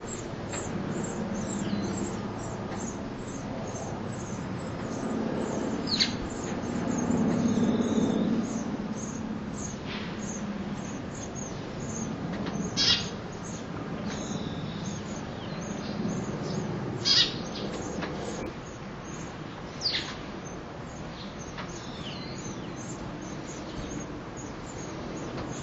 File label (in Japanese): suzume